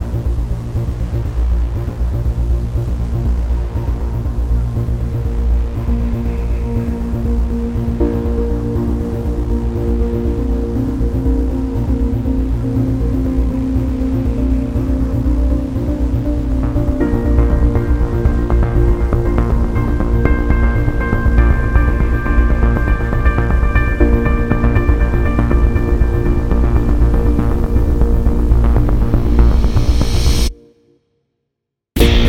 Enter Music

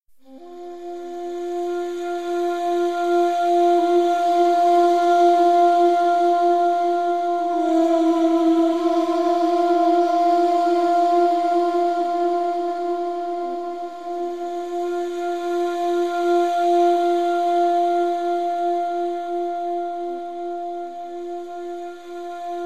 Instrumental Music

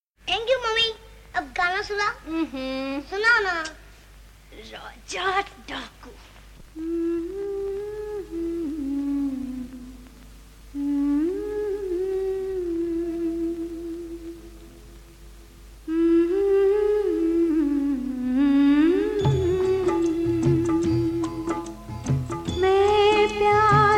female

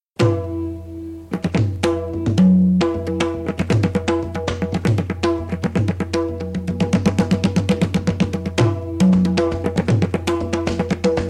Instrumental

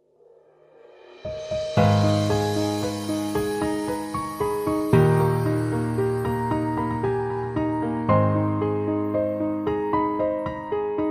Sad Version Unplugged Cover

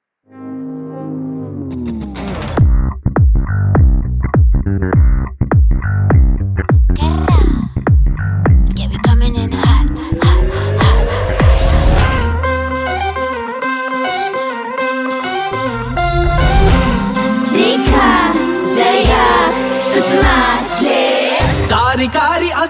Rap

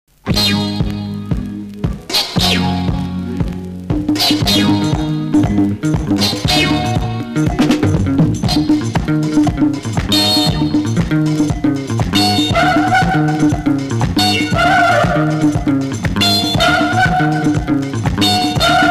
Disco Music

